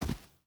footstep boots sand jump land; Rust

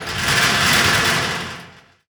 Amb Constructions; Red Orchestra Ostfront 41-45

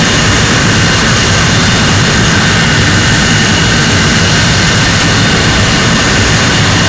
jet side, engines; War Thunder

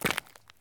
run concrete; Escape from Tarkov

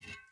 ui pickup metal hollow large; Rust

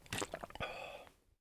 item tetrapak putaway; Escape from Tarkov

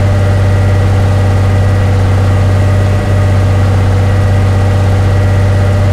chieftain engine rpm 33 load, tanks; engines; War Thunder

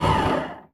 Aircrafts Air Brake End; DCS

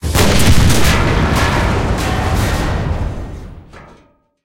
tank destroyed; tanks; War Thunder

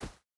footstep barefoot snow run; Rust

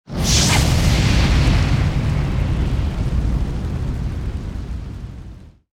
damage vehicle; World Of Warships

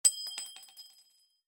shells 20mm bolt

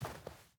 footstep rubber boots snow walk; Rust

